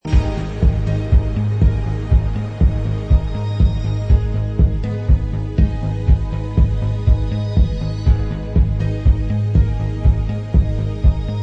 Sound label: Instrumental